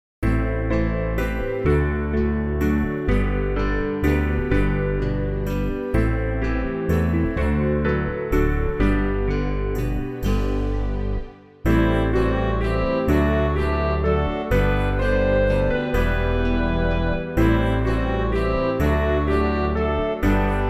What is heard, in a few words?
Who May Ascend instrumental; New Hope Music